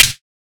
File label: claps, dance drum kit